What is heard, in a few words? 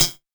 perc; Anthemic.Electro; drum hits